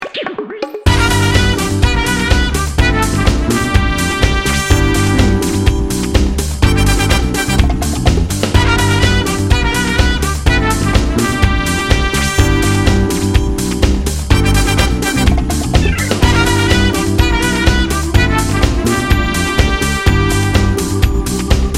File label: celebration funk house